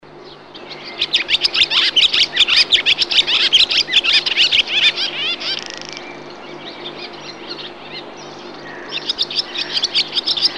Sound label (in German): Rauchschwalbe, vogelstimmen; vogelstimmen-trainer